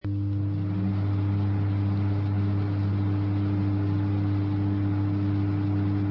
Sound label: microwave; de rats apartment